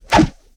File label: Kung Fu Old School Whoosh, Hand To Hand Combat; Sony Pictures Sound Effects Series